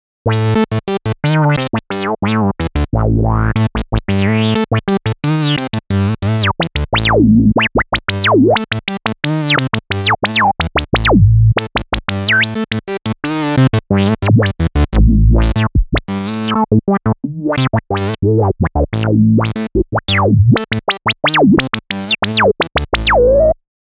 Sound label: saw